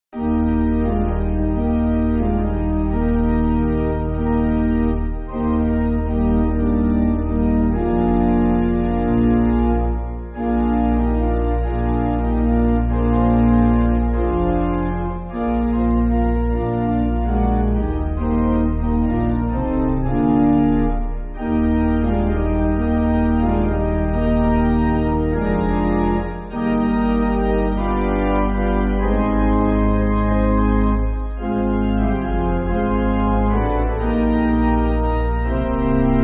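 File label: Pipe Softer, Pipe Organ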